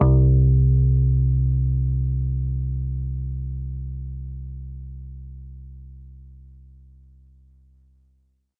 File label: Pick Bass C2; Neptune